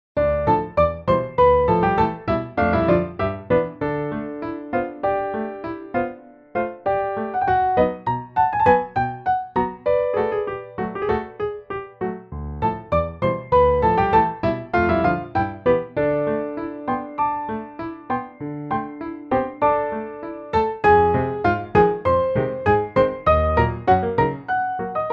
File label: polka, piano duet; 39 steps